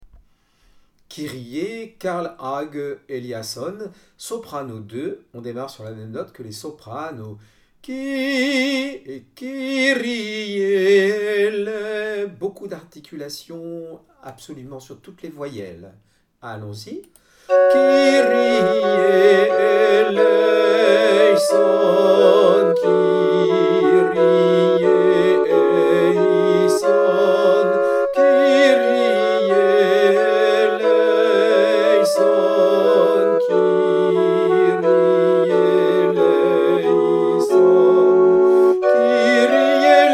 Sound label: kyrie sop